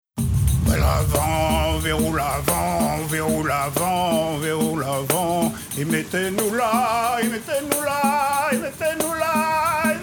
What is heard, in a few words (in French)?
vent tambourin; citypercussion